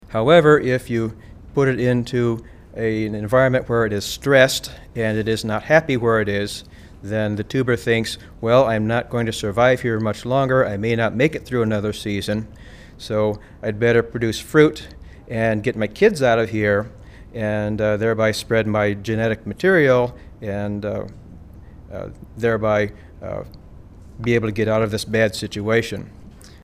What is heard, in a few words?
Male horn